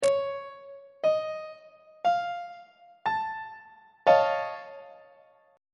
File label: F augmented 7th 2nd inversion on treble clef